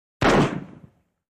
M-16 Automatic Machine Gun; Fire, Single Shot With Bolt Action.